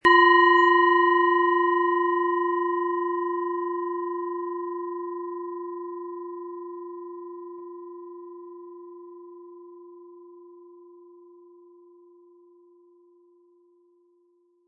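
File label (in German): Planetenschale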